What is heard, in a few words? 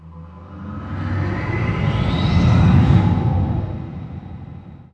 TurboLift Up; Babylon 5